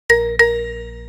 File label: Nokia message